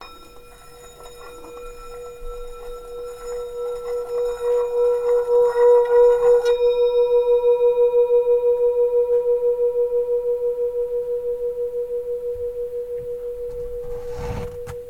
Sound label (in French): bol bengale 3-400g si